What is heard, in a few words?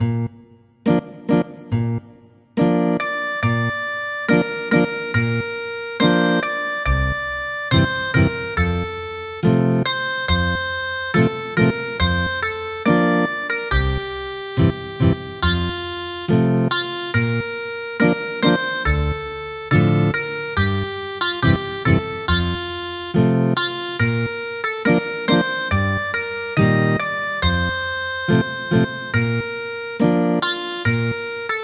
Ethnic Guitar, traditional; Du gamla du fria